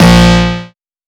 phonk cowbell A; resett; phonk toolkit; rocket powered sound

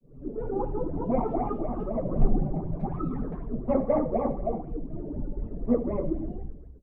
drunk high phasy out of it; sound galaxy vr universal fx; nova sound

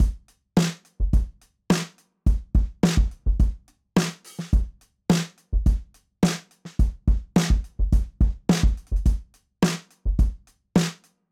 era drums 1970s, rock and soul; royal studios; 1970s presets for addictive drums

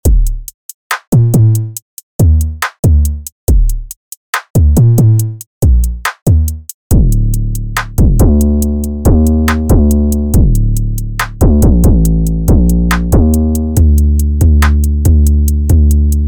808 box; 808 box 2 by iceberg audio

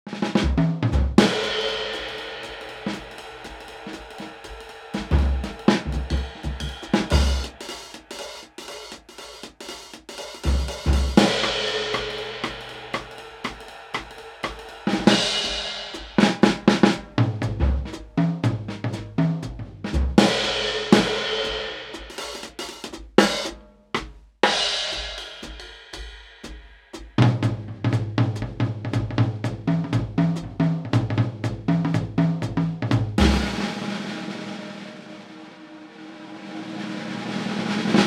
jazz drums; kontakt; authentic soundware

